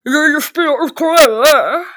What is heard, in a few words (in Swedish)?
gammal man gillar att spela ukulele